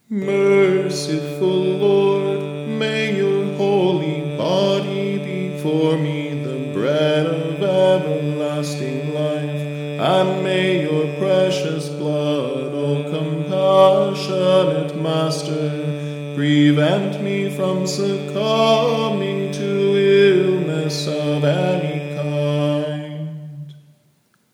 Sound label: Canon for Communion